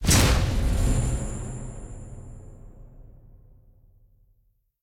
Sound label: portal, endermen; mob; Minecraft; Votive World